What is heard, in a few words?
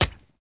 squish